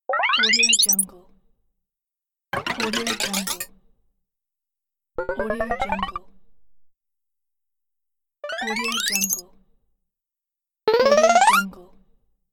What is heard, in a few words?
playful tally game bonus